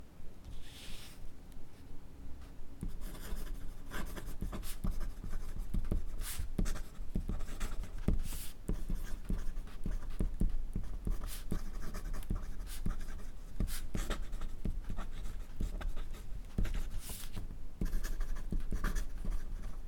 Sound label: pen